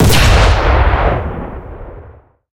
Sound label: cannon 45mm 20k shot, cannons tanks